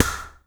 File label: WD L, DRM HITS; Spectrasonics; Liquid Grooves; WAVDRM HITS1